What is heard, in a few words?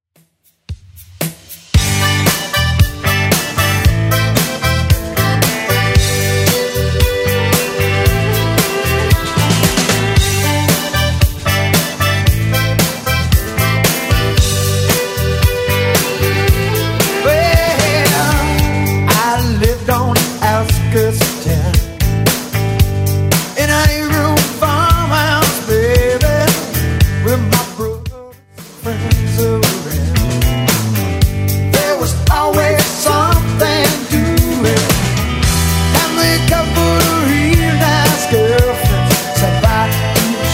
80s Redrum 114bpm